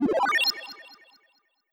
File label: heal short low